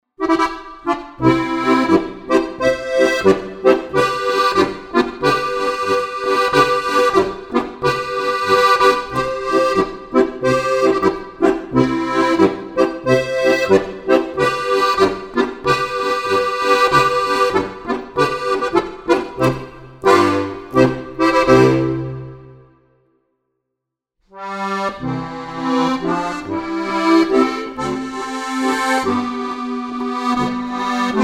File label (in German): Steirische Harmonika